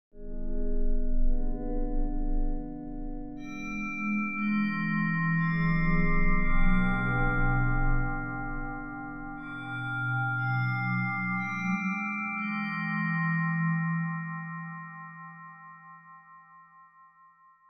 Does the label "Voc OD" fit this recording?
No